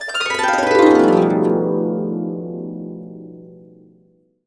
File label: B, Harps